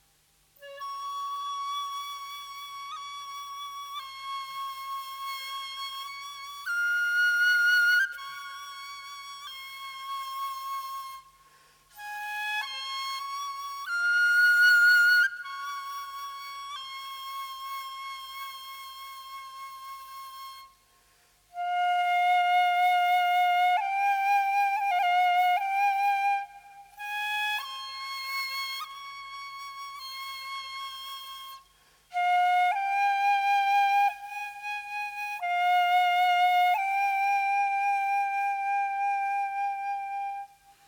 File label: Komoriuta